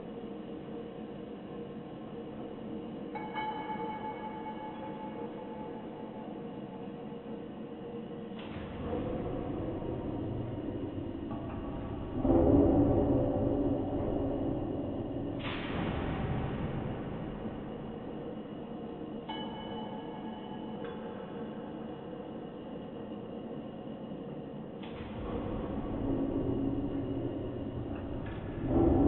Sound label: industrial loop; Audio Game maker Sound Library; worldsounds